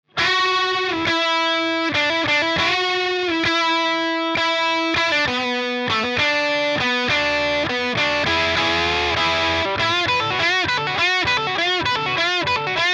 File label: el gtr solo before after